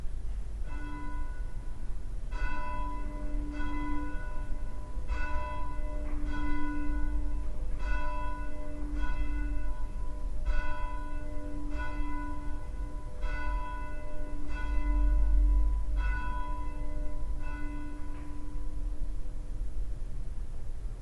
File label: bell